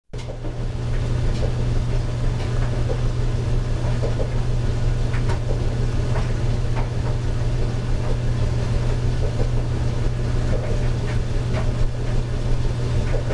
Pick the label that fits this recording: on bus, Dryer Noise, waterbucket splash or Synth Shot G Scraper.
Dryer Noise